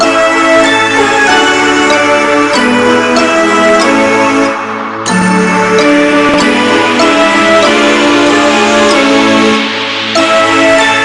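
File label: background music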